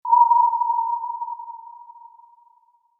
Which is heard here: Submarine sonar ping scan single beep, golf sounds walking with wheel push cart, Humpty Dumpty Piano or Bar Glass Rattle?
Submarine sonar ping scan single beep